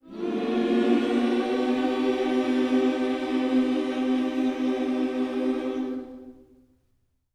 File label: MM MINOR, Gospel